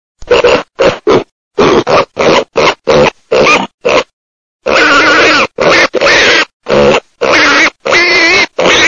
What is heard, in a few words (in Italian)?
CINGHIALI PICCOLI PASTURA